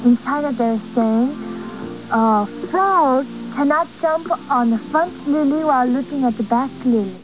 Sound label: frog